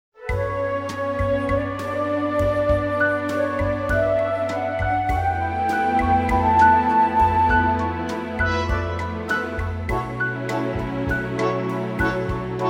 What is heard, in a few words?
Bm Orchestral; key - Bm - vocal range - B to A; Stunning orchestral arrangement